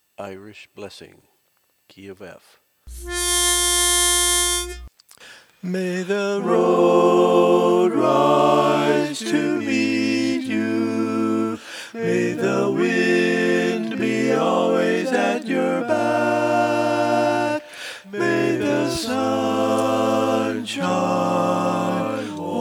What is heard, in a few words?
Bass